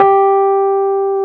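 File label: Piano G3 f 73, Rhodes 73 Suitcase; Keyboards of The 60's and 70's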